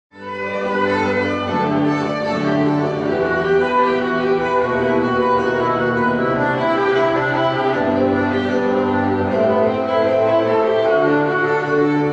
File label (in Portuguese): ORQUESTRA; DIVERTISSEMENT